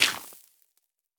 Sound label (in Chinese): 刷子刷沙砾完成声